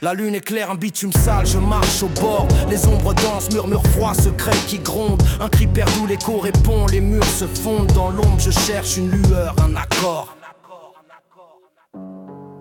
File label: Bom Bap Rap; rimes embrassees